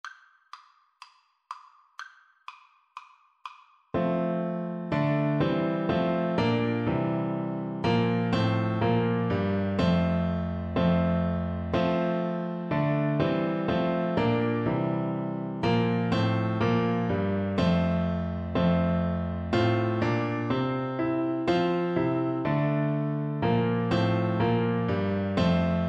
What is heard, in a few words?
good king, Bassoon